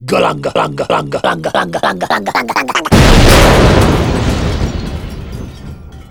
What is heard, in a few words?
galunga bomb